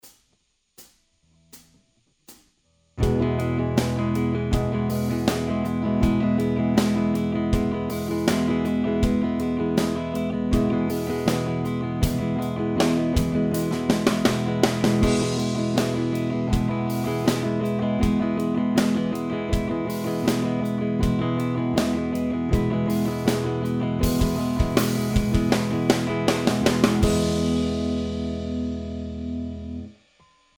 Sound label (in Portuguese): Sub Div Ritmica 1 batida por tempo